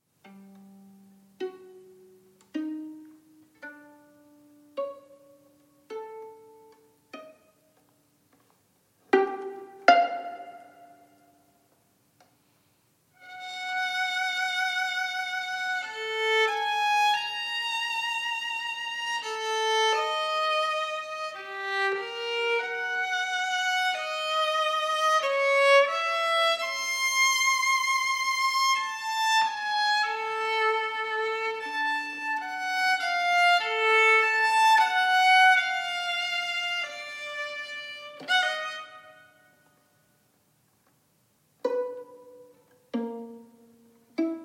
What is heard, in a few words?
partita for solo violin dialogo